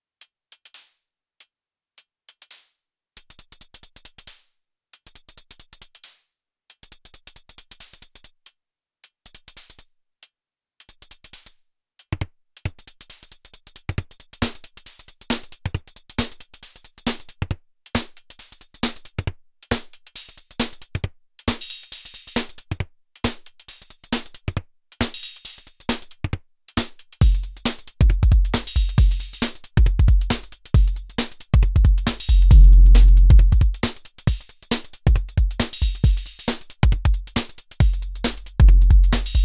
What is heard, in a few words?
nord drum octatrack